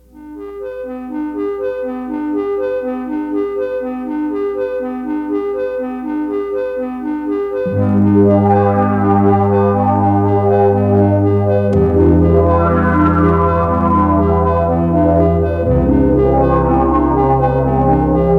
Synthesizer parts

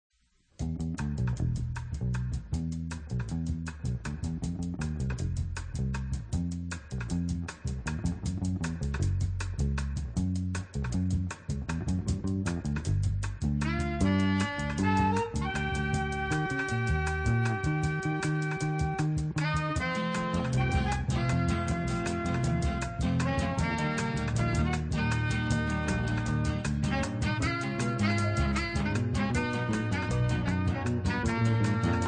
Jazz Band; Alaka Seltzer

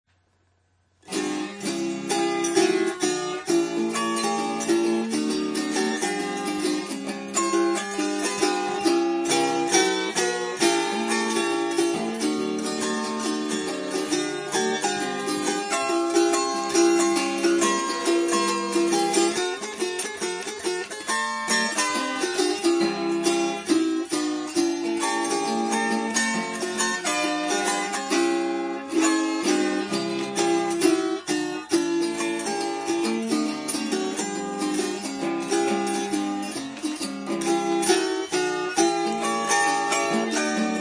untuned aharp

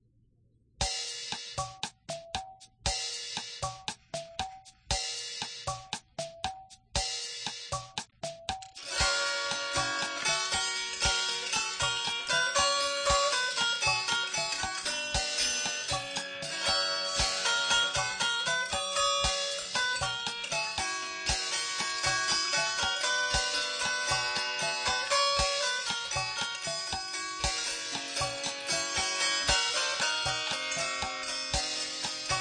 Seq, AHarp

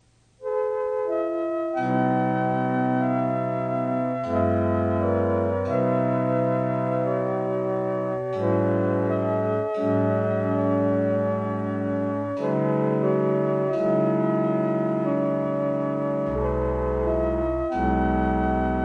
Chorus Flute

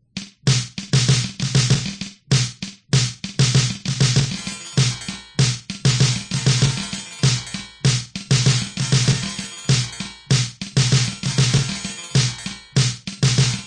Bm-Gm

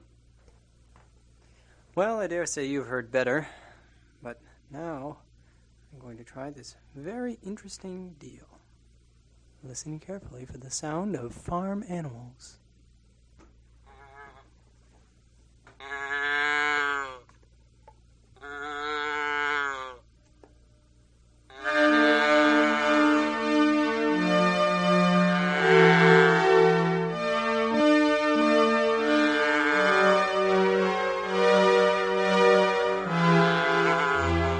Farm Animals P600 Strings

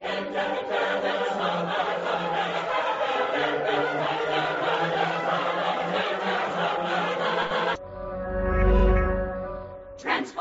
Operatic Chorus

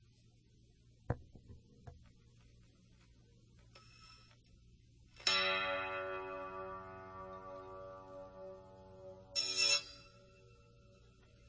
Harp song

